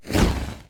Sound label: Void Fireball